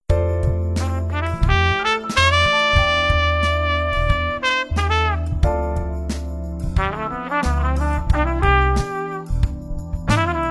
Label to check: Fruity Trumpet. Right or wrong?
Right